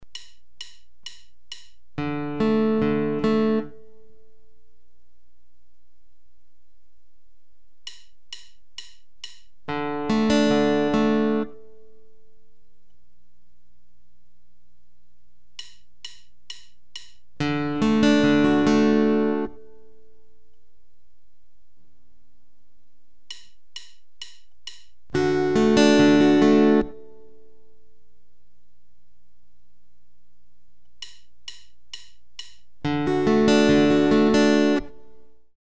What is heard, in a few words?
Travis style, guitar